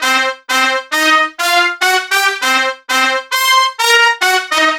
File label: Stacc Trump; 70’s Funk